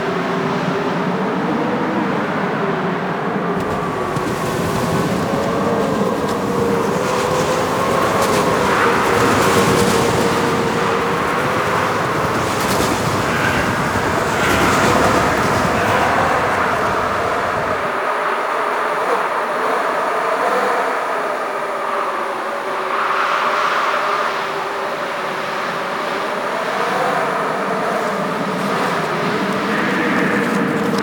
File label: Cold Wind Amb; Warframe; Ambience; Corpus; Crp Exterior